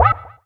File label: Pulse